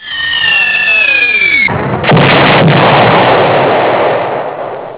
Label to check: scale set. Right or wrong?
Wrong